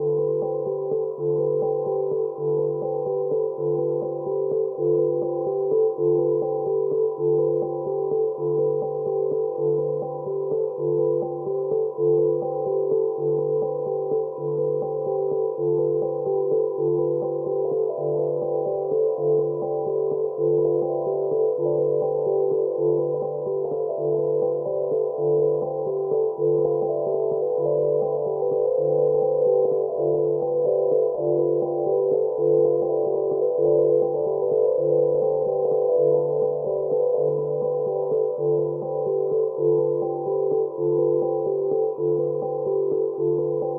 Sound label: Granular; Flesh Blood Concrete